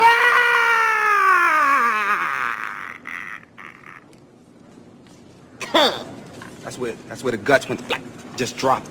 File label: yahhh; dave chappelle